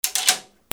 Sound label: HOUSE